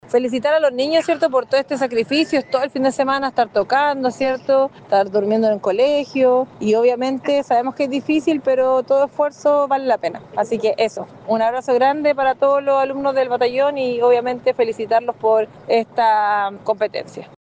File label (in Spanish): BANDA BATALLON; MELIPILLA; liceo politecnico obtuvo destacada participacion en competencia